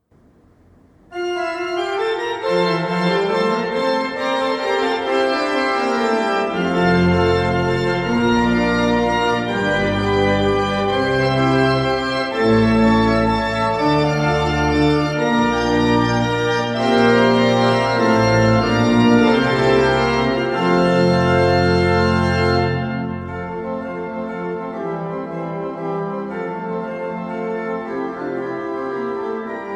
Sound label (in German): Praludium fuge in F Dur